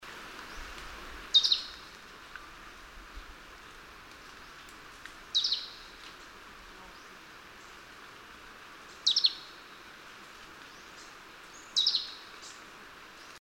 Hume's treecreeper

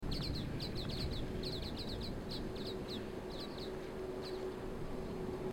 Common waxbill

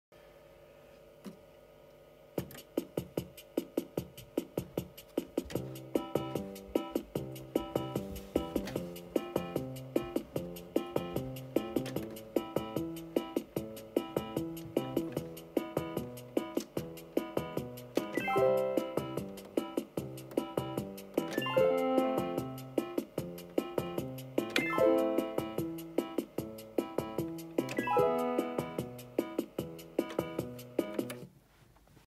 Omnichord

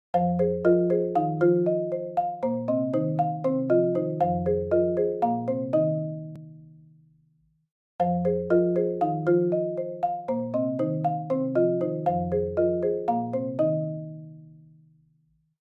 Marimba

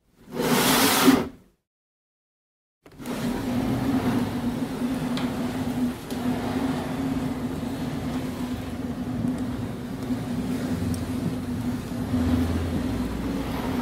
to push; هل دادن

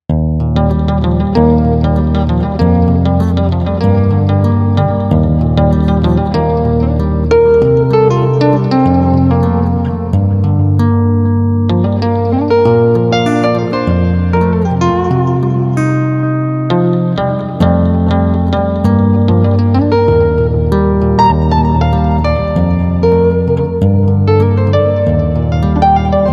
Chapman Stick

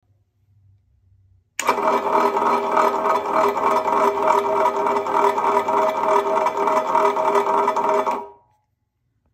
Rolling, نورد آهن

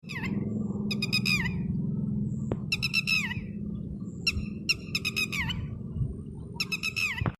Red wattled lapwing

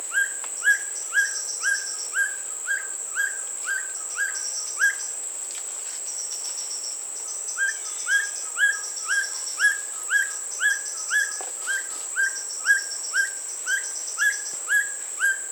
Black Bushbird; پرنده بیشه مرغ سیاه

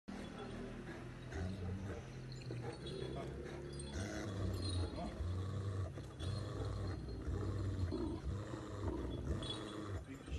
sarabi dog; پارس سگ; سگ سرآبی

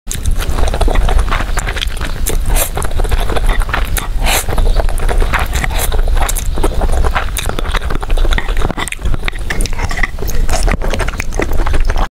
Edible noodles

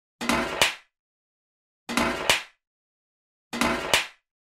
metal tray; افتادن; افتادن سینی فلزی

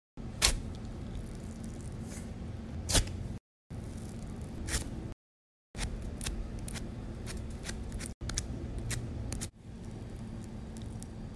wax; کندن وکس

